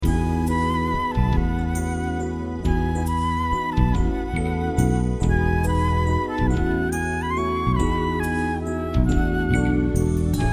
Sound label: jazz